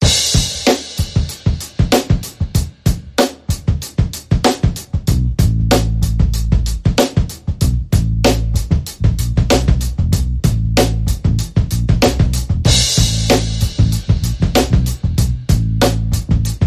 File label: Drum & Bass Only